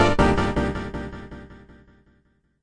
160 two hit synth echo, drum loop; 141 to 160 bpm

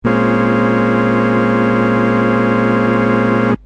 farfisa chord min7; instruments single samples; Pads

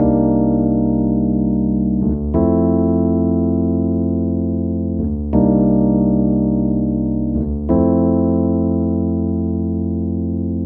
chill rhodes 90 Ebm7 Fm7; KEYS ORGAN PIANO; MELODIC SAMPLES

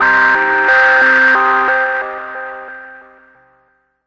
crunchy pretty synth; REMIXABLE COLLECTIONS

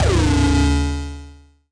nasty stormfront; Nasty Stormfront Electro FX

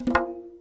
arabic perc, Arabic Percussion Kit

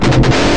metal guitar strum

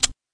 click; REMIXABLE COLLECTIONS; Ze Franks Pain Pack; pain pack percussive and bips and clicks

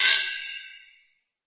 Processed Ethn Perc, Doru Malaia Percussion

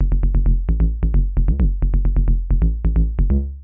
acid twiddle; SYNTH AND ELECTRONIC LOOPS

